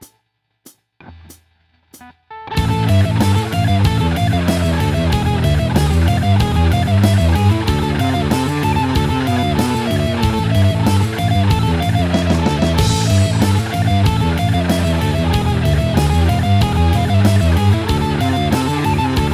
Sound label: solo